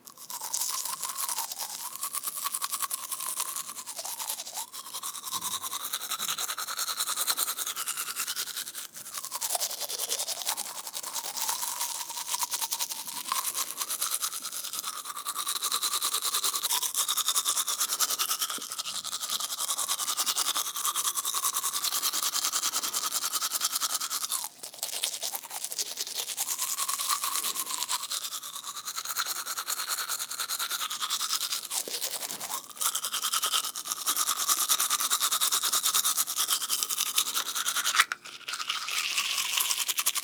toothbrush (basic); Bathroom; Kevin Durr; Essential Bathroom Sounds

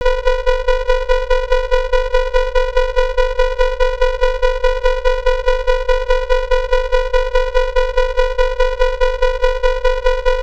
Synthesized Alarm; Digital Sounds; soniKSound; HUD, Beeps, Devices

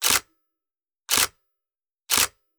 camera nikon d5500 shutter 1 to 2000s; Cameras; mono; SoundHolder

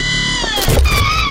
MECH Servo Motor Movement Stomp Long; GameBurp; 2000 Game SFX

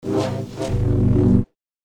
Double Bladed Saber Sound; FXHome

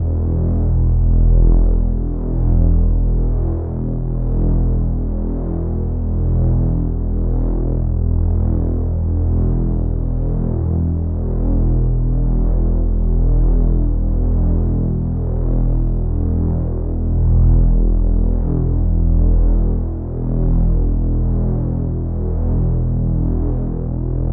Synth Drone, Scare Tactics; Glitchedtones

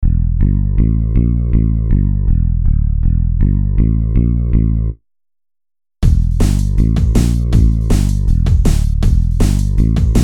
Two Lead

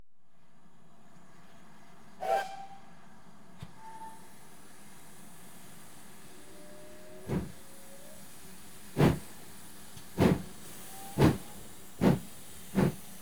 Train Departs R; BBC Sound Effects Library; Age Of Steam

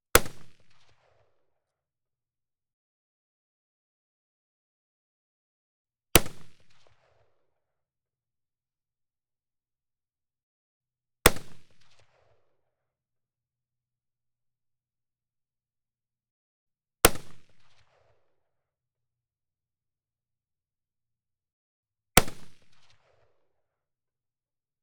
M38, Firing, Mosin-Nagant M38 bolt-action rifle; Pole Position

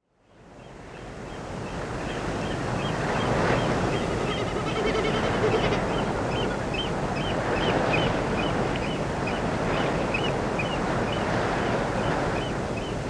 Heather Morning Late Spring; Rural Soundscapes; BBC Sound Effects Library

